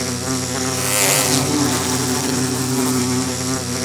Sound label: bee aggressive; Minecraft